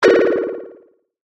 Multimedia Inquiry, Technology, Multimedia, Inquiry, Element; Alerts